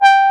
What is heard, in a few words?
JX BRASS, Jupiter Synths; E-MU Formula 4000 Series Vol. 3; Analog Odyssey